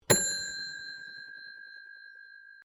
Telephone Wooden Automatic Dialing Wallphone Bell Single; Various old telephones